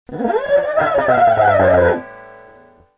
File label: pick slide, egitarre